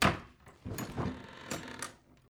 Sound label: Wardrobe Open; Lucius III; pc linux